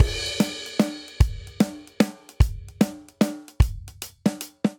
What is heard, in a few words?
Swing Waltz Verse Variant 3/4 150 bpm, Drum; MIDI Clips; Ableton; Factory Packs; Drum Booth